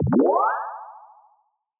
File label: Battery; バッテリーチャージ音; battery charge